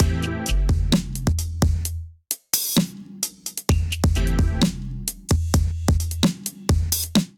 Sage Reef Kit Melodic 130 bpm, Drums; MIDI Clips; Drive and Glow; Ableton; Factory Packs

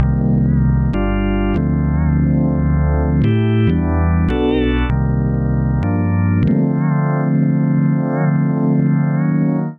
C Minor 90BPM; MIDI Clips; Accompaniment; Phantom Rotation; Ableton; Chop and Swing

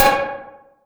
digi pizz; analogBully; Ableton Foundation FreePacks; Wicked Kits

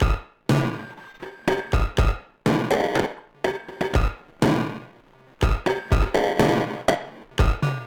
Toy Hits & Clock Groove 122 bpm; MIDI Clips; Drums; Ableton; Factory Packs; Lost and Found

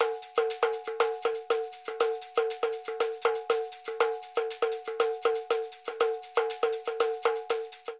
Candombe B Shaker Tambourin-120bpm; Latin Percussion; Ableton; Factory Packs

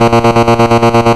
Sawtooth-Trem A#1; Ableton Foundation FreePacks; Foundation Glitch Toys & LoFi

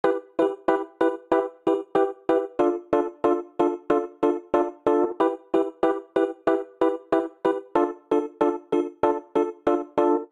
Rcrd Plnt E Minor 93 bpm; MIDI Clips; Tonal; Accompaniment; Ableton; Factory Packs; Chop and Swing

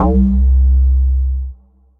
Deep FM Bounce, Bass

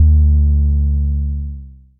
Pitch Bass; Synth

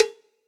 Cowbell Big; Drums; Drum Hits; Standard Hits; Cop Style; Ableton; Factory Packs; Session Drums Studio

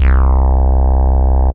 Bass; Bass On The Road

